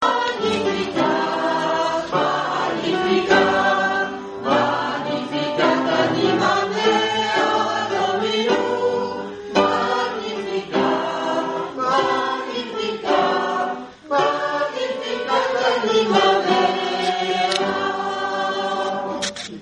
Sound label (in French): canon principal tous